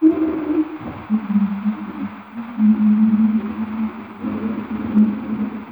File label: noise kimoi; 耳障りなノイズ３; 声っぽいものが入った気持ち悪いノイズ ループ